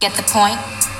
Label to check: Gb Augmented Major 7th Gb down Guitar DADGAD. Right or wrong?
Wrong